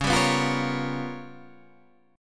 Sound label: G 7th Sharp 9th Flat 5th Db